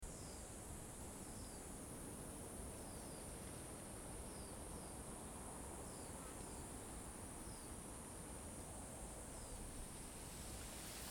On The Top Of The Colline 77 with Wind Breezing Few Birds and Leaves; 七七高地; 花蓮縣壽豐鄉; YuChing Teng